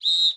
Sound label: Tiger Tamer tiger whistle